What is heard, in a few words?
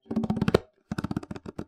Fill, Conga; musicradar